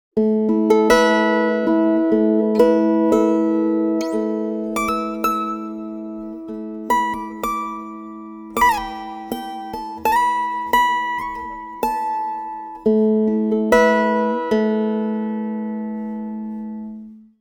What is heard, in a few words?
guitar, pitchshift; izotope radius